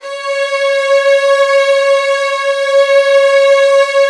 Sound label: C#2, Strings; ILIO; Kirk Hunter Virtuoso Strings VOL-3; STR TAME